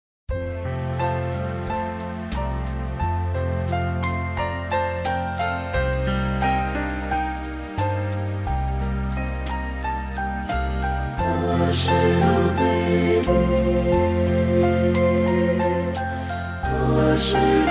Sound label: satb